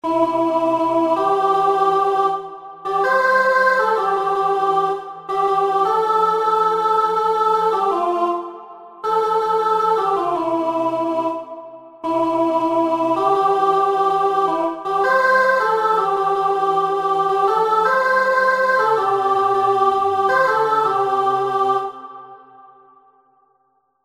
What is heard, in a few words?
Chorus Alto